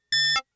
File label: tonal beeps modulated ring various pitches ui; prosoundfx